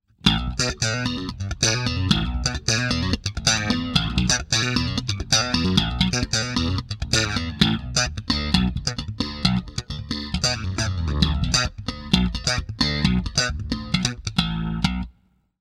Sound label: Flanger slap